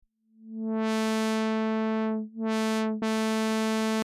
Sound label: mini V cutoff auto